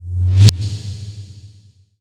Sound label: spawn; KevinBlast; marble